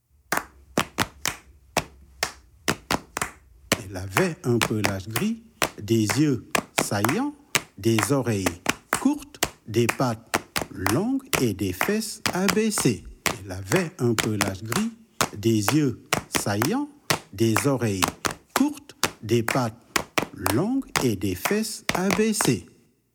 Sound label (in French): musique percussions voix; le lionceau; hyene